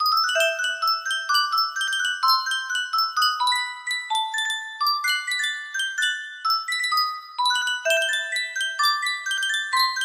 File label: Sankyo music box, music box melody